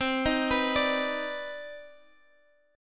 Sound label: C Minor Major 9th C Guitar Half Step Down